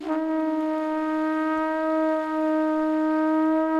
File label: Horns